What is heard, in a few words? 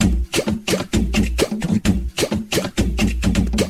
loop funk